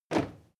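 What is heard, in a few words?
step crowd march normal single right, footsteps; schritte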